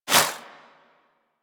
Throwing Star Whizzby; Warframe; Weapons

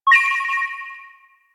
UI Scenario Beacon Request Call; Player Ship; Warframe; Railjack

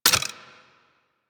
Bubblegun Pistol Mech; Warframe; Weapons; Tenno

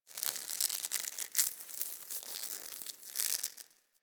Tendon Stretch Small Close; Ambience; DE; Warframe; Infested Eris

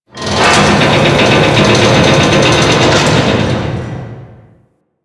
Ocean Machine; Warframe; Grineer Ocean

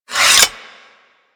Axe Sheath; Warframe; Weapons

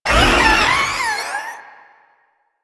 Maggot Attack; Enemies; Vocals; Warframe; Proto Infested

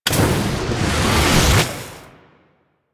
Fire Ball Charging; Warframe; Ember

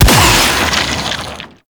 Shot; Warframe; Weapons; Infested; Inf Sniper Rifle; Coda Sporothrix

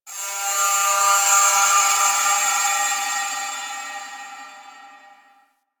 Moon Texture Medium, Ambience; Warframe; Orokin Moon

